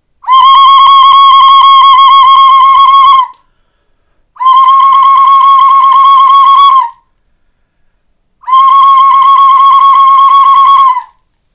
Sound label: whistle